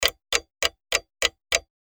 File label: clock - ticking